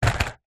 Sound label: leisure table soccer game small set down on surface